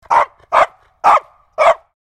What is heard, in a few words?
Small dog growly barking barks